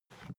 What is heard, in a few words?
briefcase plastic slide